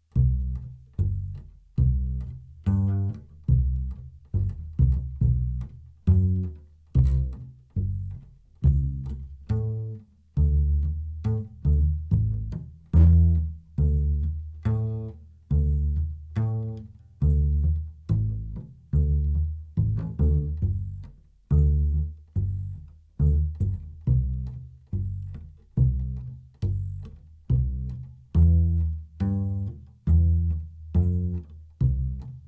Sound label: doublebass verse